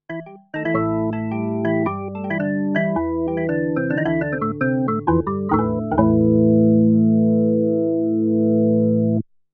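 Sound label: K150 organ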